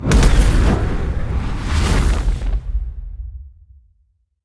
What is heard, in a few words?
skill ignite release